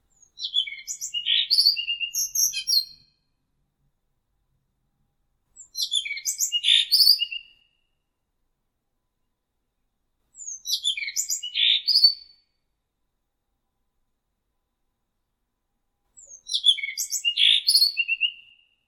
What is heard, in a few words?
blue rock thrush, animals